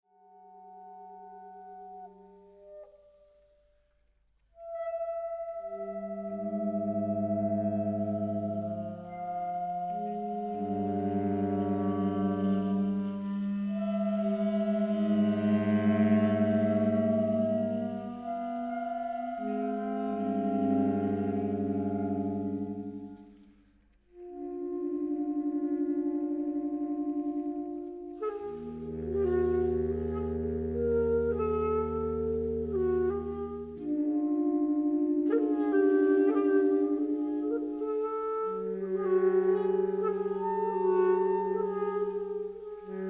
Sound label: drops